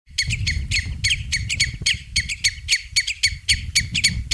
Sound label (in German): Rotschenkel; Ruf